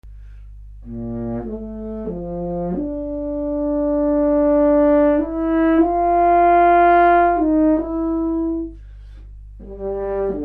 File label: horn